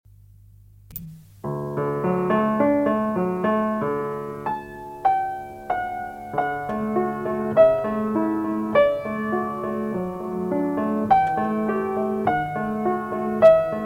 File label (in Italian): danza classica, rilassamento; musica per danza classica e ginnastica artistica